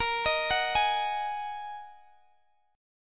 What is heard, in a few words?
Bb 7th Suspended 4th Sharp 5th Bb Guitar DADGAD, chord; x,x,20,20,21,18